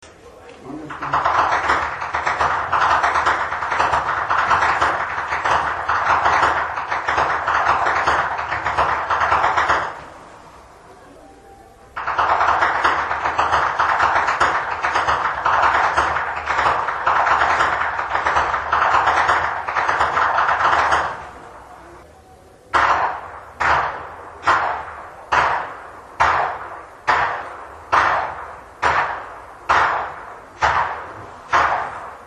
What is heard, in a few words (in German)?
schnalzen, flachgau; anthering